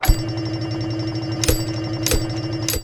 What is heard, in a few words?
Slot Machine Mechanism Full